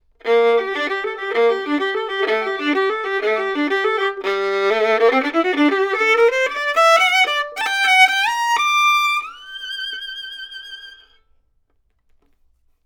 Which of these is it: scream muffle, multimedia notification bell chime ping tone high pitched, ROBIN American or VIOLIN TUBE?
VIOLIN TUBE